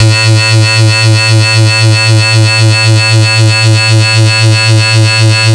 FS (A2), Synth; neuro; Stanza; FightSynth Multi

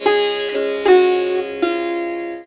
SITAR LINE, SITAR MELODY; Best Service; World Colours

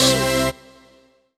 HOUSE, House Dance; 90 s Sample CDs; Best Service; Dance Mega House Dance

